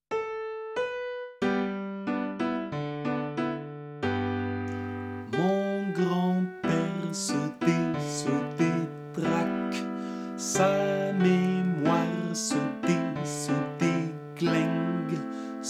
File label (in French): GRAND-PERE; Mérignac